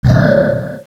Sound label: "huugh", Echo inside caves, underground; DS; ML BOWSERS INSIDE